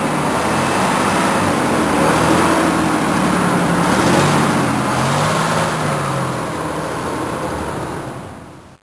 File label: Bus Driving